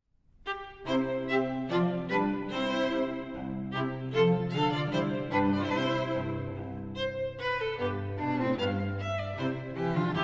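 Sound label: string trio; lachner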